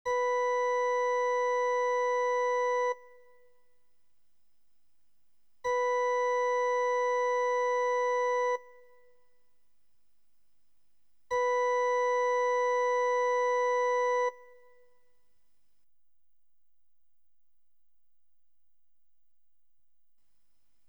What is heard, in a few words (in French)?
Note vocale Si, blokfluitstarter